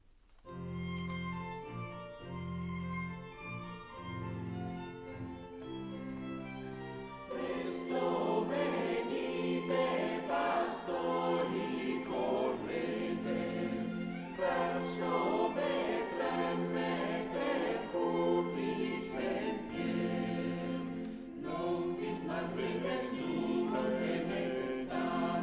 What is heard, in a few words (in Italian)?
Venite Pastori